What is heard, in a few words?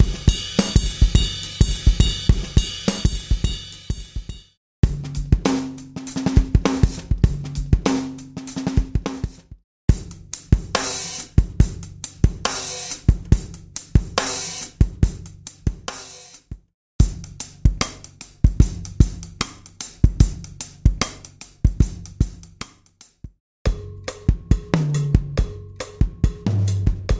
mixed vintage drums